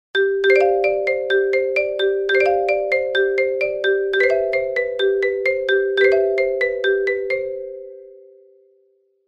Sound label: marimba ringtone